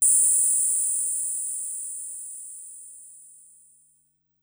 Noise High, Korg Wavestation-SR, Drum Kit; Wave 455 - Drum Kit

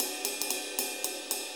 6-8 Ride, HiHat & Ride Loops

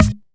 Percussions

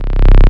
Basses Root C

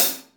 HH CL, Drum 1 Dry; East Collexion

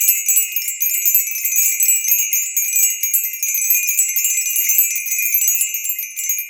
bells, bowls; Best Service ProSamples vol.52 - World Instruments 2; AKAI

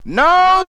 VOCALS; Ueberschall; Jam Box

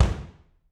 BD, Drums; Bob Clearmountain Drums I

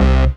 Dance Mega Synth; Best Service; 90 s Sample CDs

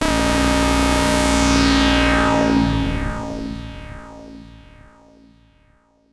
Monotron Sci-Fi

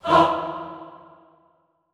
HAH E2, Classical Choir, short hah; Best Service

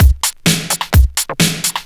Straight Loop 128 BPM, House

